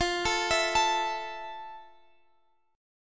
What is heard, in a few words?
F Major 7th F, Guitar, Half Step Down